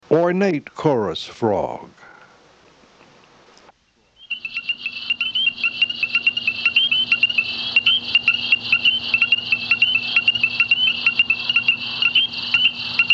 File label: Ornate chorus frog (Pseudacris ornata); Family Hylidae - Treefrogs